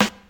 natural acoustic snare sound g key, steel snare drum tuned to the G note; snare drum samples